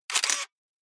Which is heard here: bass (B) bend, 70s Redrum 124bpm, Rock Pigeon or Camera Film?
Camera Film